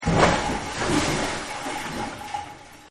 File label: Crash Rolling Hubcup; Crashes; Objects; Partners In Rhyme